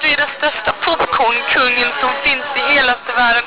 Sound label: popcorn